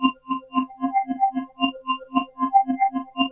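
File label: vector high, vectoring; Zero-G Ambient 1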